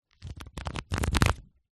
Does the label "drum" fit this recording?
No